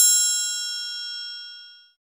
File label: triangle, percussion